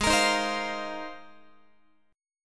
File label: G sharp 6th Add 9th G sharp Guitar; strummed; JGuitar